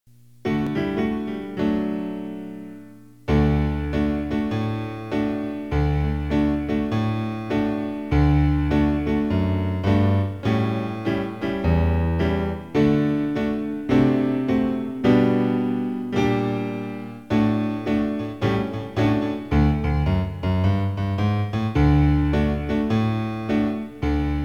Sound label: piano slowly; slowly tempo; PSE Music